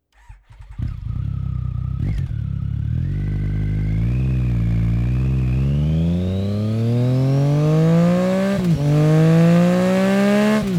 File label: Triumph Street Triple 675 w Arrow Exhaust